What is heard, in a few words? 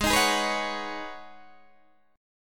G sharp Minor 11th G sharp Guitar DADGAD, chord